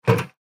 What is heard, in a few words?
Bucket Five Gallon Metal; Foley; Blastwave